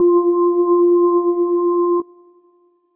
organ F; organ 2; leads

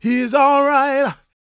gospel male, vocal; ILIO; Vocal Planet

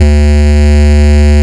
more bass D2; LIMAT LSDJ kit; LSDJ Extra Bass Notes

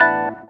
DR. LOOP; X-Static Goldmine 3; CHORDS

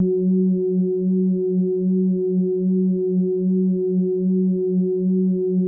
F#3, synth basses; Music Store Sound Service; Einstein

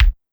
Kick Linn, Drum Machines DDrum-AT Pitched Down; 300 Drum Machines; Clavia

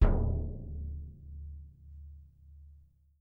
BD P, Percussion; Miroslav Vitous - Percussion & Harp

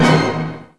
CLASSIC, CLASS.HIT; East West; Global Sounds

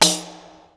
TAMBOURI.HIT; Best Service; World Colours

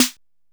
drm D#3, DRUMCOMPUTER, EMU SP 12; Best Service; ProSamples vol.55; Retro Sampler; EMU SP 12+1200

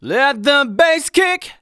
vocals sung F#; Techno Trance Essentials

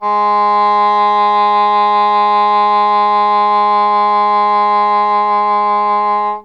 English horn G#3; Q Up Arts; Denny Jaeger Private Collection

